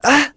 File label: Vox Damage; Lucias; kingsraid; voices heroes en